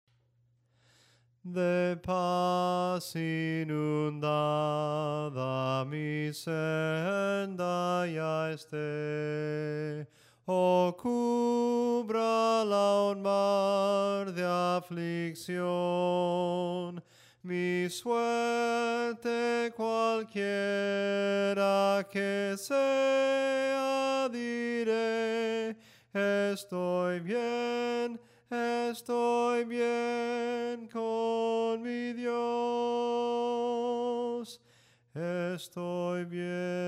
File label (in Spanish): SOPRANO CANTADO, himnos en melodia soprano